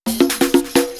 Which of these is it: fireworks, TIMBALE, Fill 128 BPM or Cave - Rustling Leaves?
Fill 128 BPM